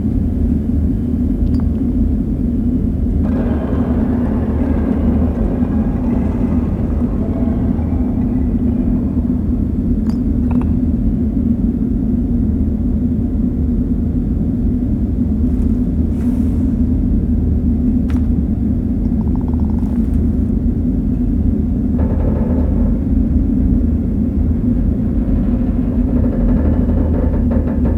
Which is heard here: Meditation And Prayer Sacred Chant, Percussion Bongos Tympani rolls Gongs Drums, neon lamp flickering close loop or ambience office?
ambience office